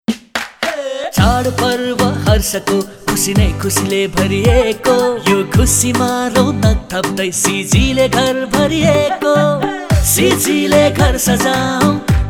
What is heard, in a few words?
DASHAIN JINGLE